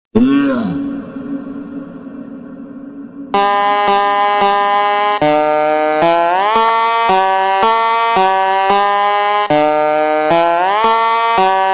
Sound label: ultra slowed reverb